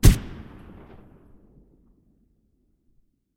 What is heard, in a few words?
beretta silencer mono distant